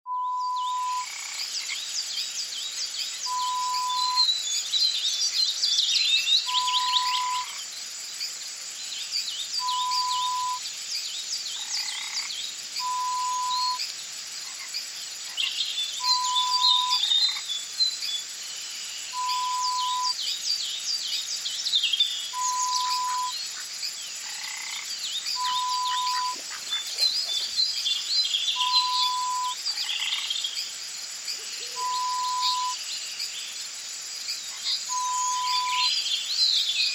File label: Pond Sound Effect